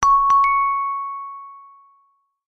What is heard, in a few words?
multimedia alert chime short high pitched notification; zapsplat